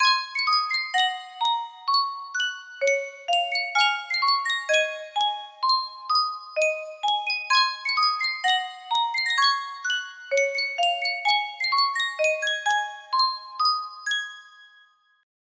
yunsheng custom tune music box

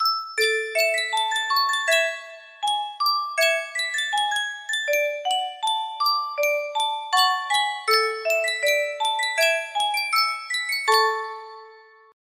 sankyo music box, music box melody

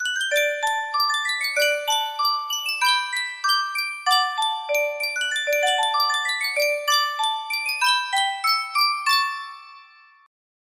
sankyo miniature music box

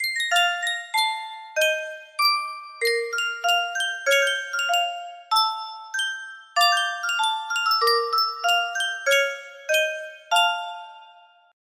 sankyo music box, melody

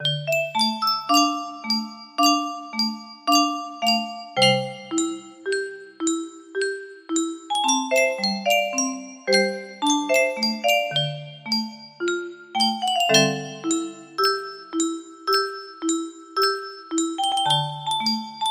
melody

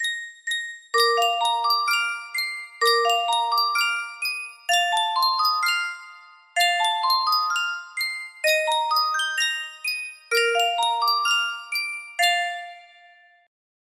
Sankyo music box

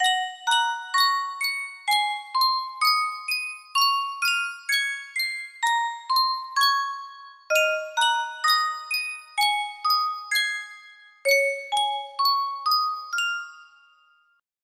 yunsheng music box, melody

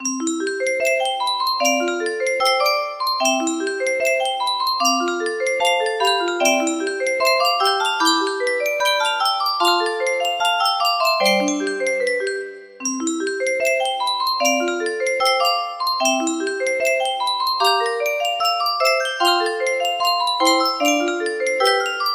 melody, music box

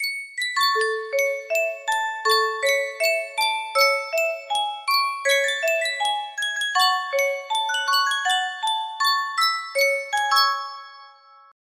sankyo music box, music box melody

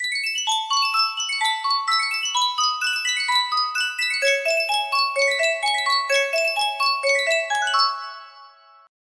sankyo miniature music box

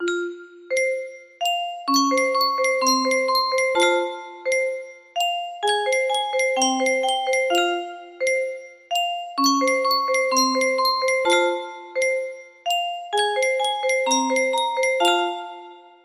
music box, music box melody

